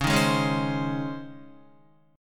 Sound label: Db Major 7th Suspended 2nd Db Guitar; DbM7sus2 strummed; JGuitar